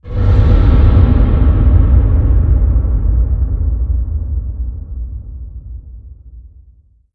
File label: amb boomer